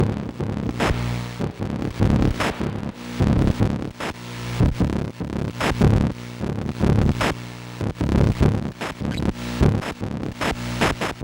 Slow Squish; Cheap Golf; Susan Supporter Pack

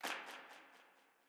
Ground Hard Hit Small; FieldBattle

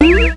Boss Multiple Hit

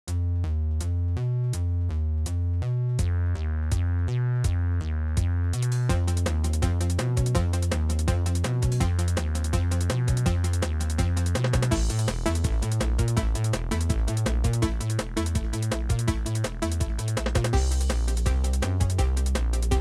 Stage Clear (Low Speed); Dr. Luigi

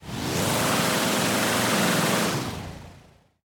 Reverse

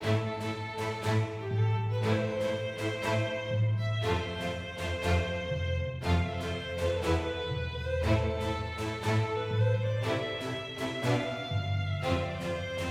heroic med

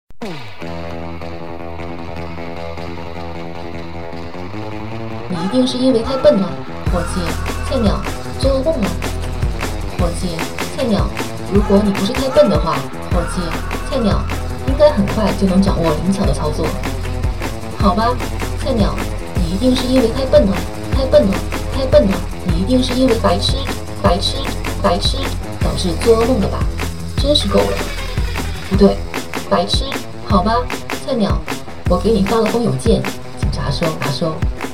Voice Song

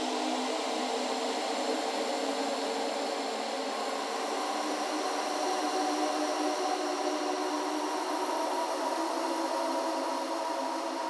Cave Ambience; gamerip; Environment; Squish

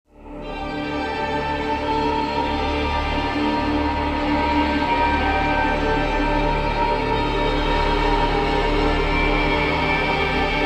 Ambient Music; Spider-Man Friend or Foe; Unearthed Catacombs ~Ambient Music A~

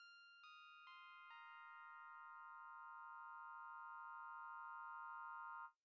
Intro Pad L; the legend of zelda tears of the kingdom; Small Dungeon

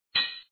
Spear Hit

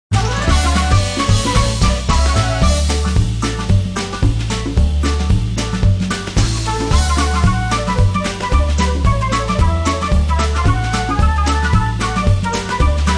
Stage Music; New Super Marisa Land; doujin game music

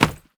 enemy death land leg; Aragami

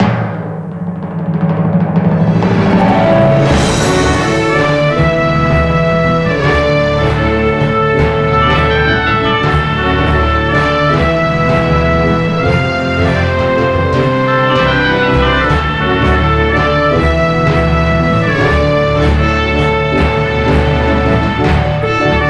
Timpani Roll-in; The Award Goes to

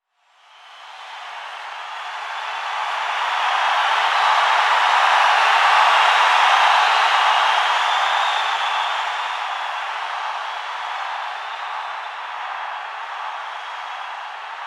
Audience; Tokimeki Idol

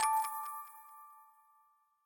Crystal; Super Mombo Quest

